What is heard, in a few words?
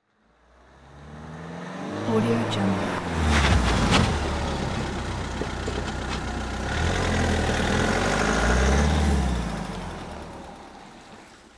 car approach brake